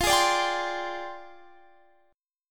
F Minor 6th Add 9th, Guitar, Standard; Fm6add9 strummed; x,20,18,19,20,20; Fm6add9 Chord; JGuitar

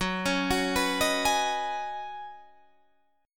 Db 7th Suspended 2nd Suspended 4th Gb

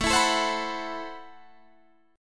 A Minor 6th Add 9th, Guitar, Standard; Am6add9 strummed; 17,19,19,17,19,19; Am6add9 chord diagram.

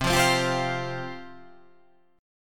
C Suspended 4th C

